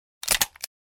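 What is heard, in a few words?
stapler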